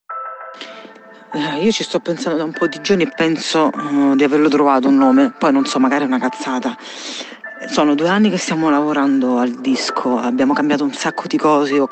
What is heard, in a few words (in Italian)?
vocale